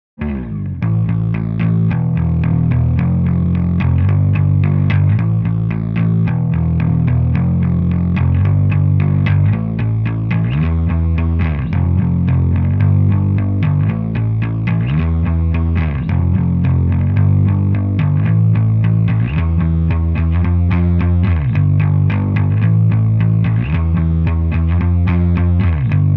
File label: ampire xt metal pack bass metal dirty; presonus